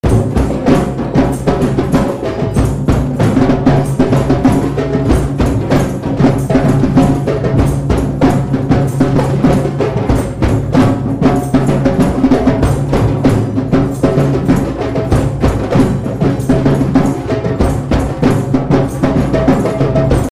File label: HipHop, drum circle